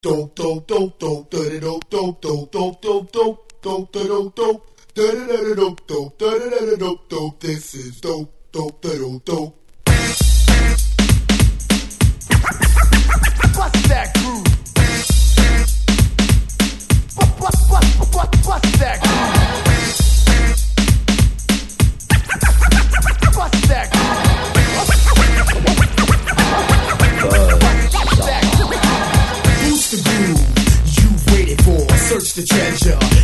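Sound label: hip hop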